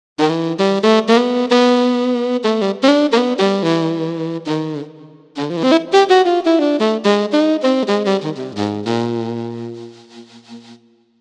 tenor sub; TURBO VL

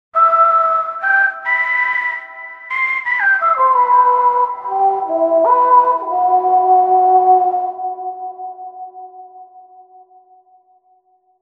Ptch Noiz; TURBO VL

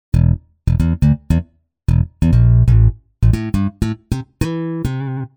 Bass

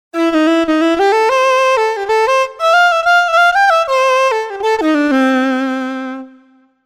Fiddle; TURBO VL

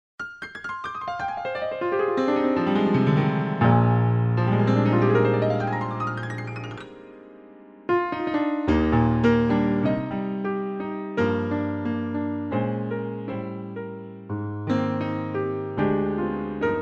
S5000 Mega Piano

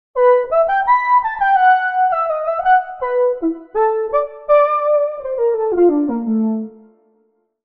Sines; TURBO VL

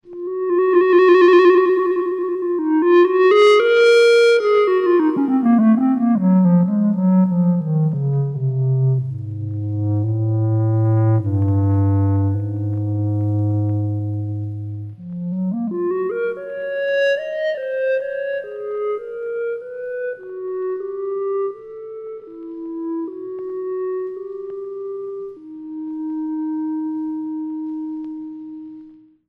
Clarinet

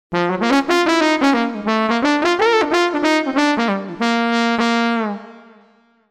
Jam Bone; TURBO VL

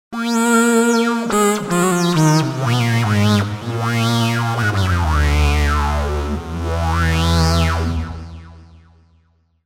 HP Filter; TURBO VL

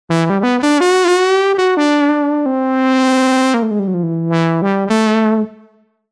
Lead

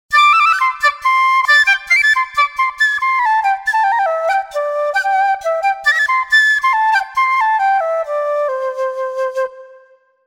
Tin Whist; TURBO VL

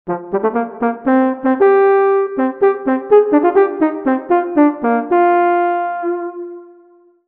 Orch Horn; TURBO VL

